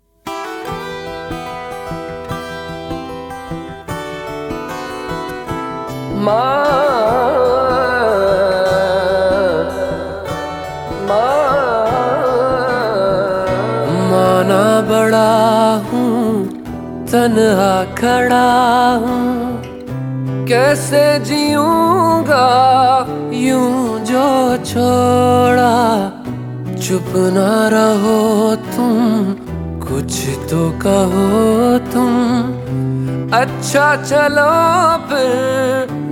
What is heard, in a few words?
Sad Version